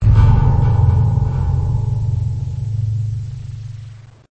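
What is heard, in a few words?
quiet metallic noise in the distance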